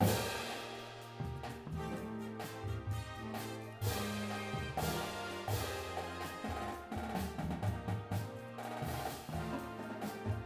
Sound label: full drumline w music